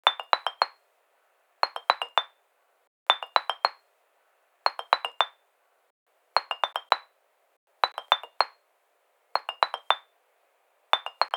Ringtone

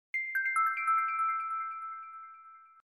Alt, notifications; Bluboo; S8

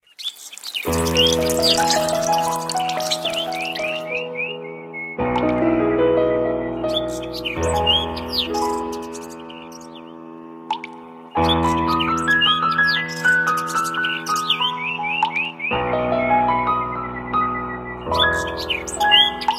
Alarm; Coolpad 5860

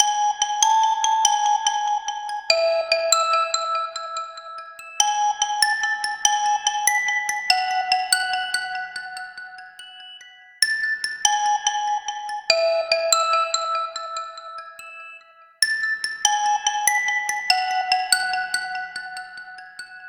Vibrato, ringtones; IUNI; U2

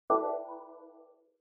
Slide close; Nokia; N85; Audio themes

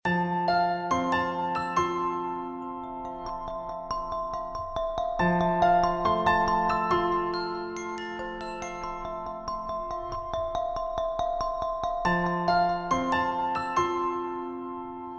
Optical Signal, alarms; Tecno Phantom V Fold

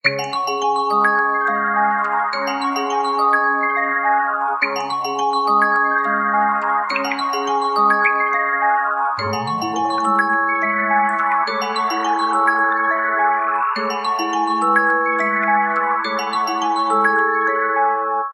Aquamarine; Samsung SCH-i510 Droid Charge; ringtones

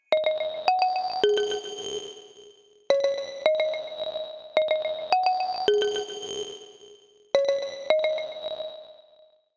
ringer marbled

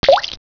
Bubbles; Nokia X6 Chinese clone; SMS

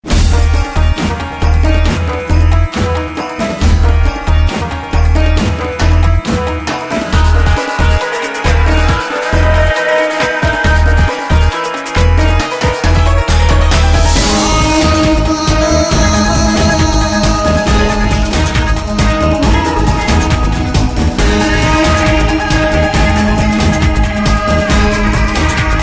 ringtones; Samsung; Galaxy S GT-i9088

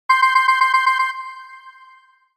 Ring Standard; HTC; T-Mobile Shadow

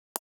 Effect Tick; Marshall; London KB 1501; ui

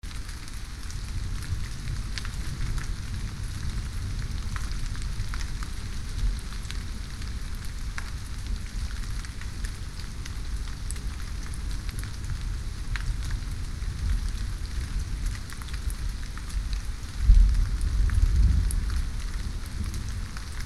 春雨 spring rain,白噪音 white noise; Xiaomi MIUI 12.5 China; MIUI12.5系统音效全收录

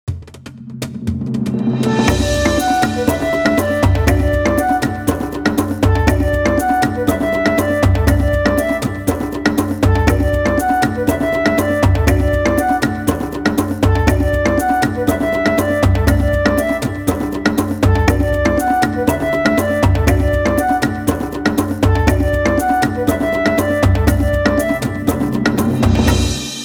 Simply African; Nokia; Nokia Design Team; Regional Tones 2013

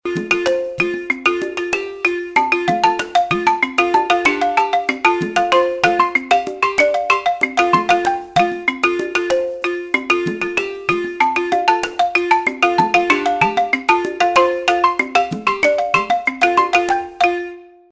Balafon; Infinix; XOS 12

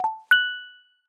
simple celebration; Google; Material Design sounds; Hero Sounds

